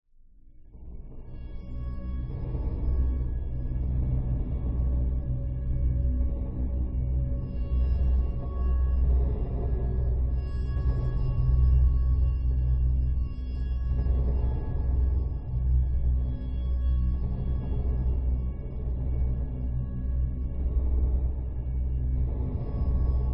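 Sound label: science fiction drone deep dark harmonic with subtle clunks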